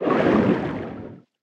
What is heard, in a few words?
creature baby penguin hold let go under